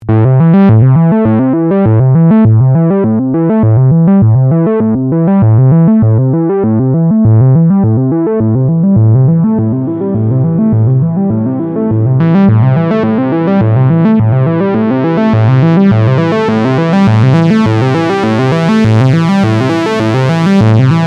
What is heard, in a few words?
Spring Reverb; Grandmother